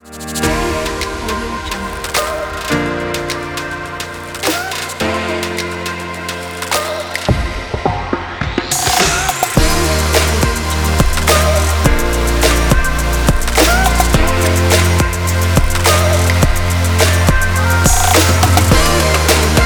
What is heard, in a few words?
fashion pop intro logo; puremusic